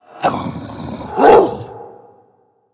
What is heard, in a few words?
Undead Beast Emote Attack; Arfus